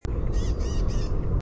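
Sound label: Northern Flicker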